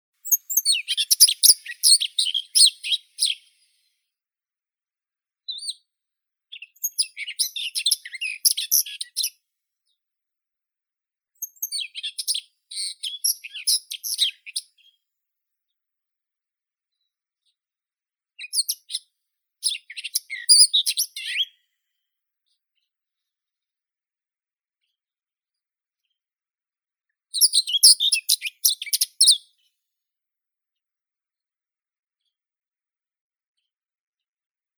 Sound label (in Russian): slavka seraya sylvia communis, zvuki prirodi